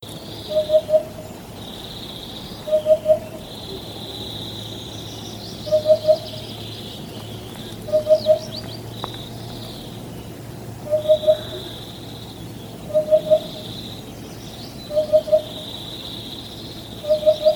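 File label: udod golos, hoopoe voice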